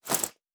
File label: Bag, Objects and Items; Survival Game Sounds - Lite Edition